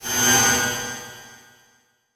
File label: Transition Metallic Swell